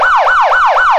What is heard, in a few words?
yelp, sirens; federal sig omega 90